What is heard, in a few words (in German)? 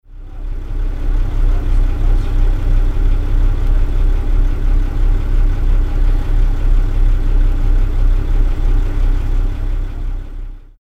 Schiffsmaschine enorme Tiefbasswidergabe